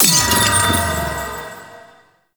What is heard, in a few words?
Boss Crystal Destroy; Weave Boss